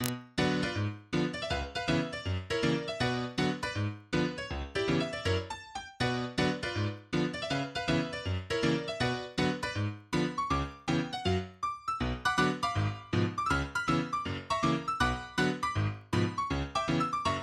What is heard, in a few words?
Ragtime